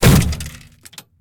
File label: bullet hit inside; War Thunder